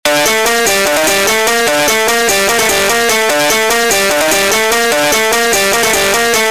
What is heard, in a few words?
Electronic Guitar Wet; distoblast